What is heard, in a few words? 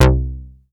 C3, BASS; Q Up Arts; Dream Experience; BASS BANK